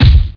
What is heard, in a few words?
kick Hit; Nevergrind